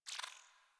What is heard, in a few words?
Dialog Close; UI; Default Theme; Warframe